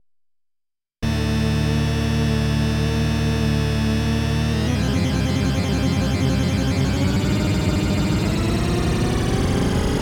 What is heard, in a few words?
Studio Electronics Quadnic Unison 2xPM1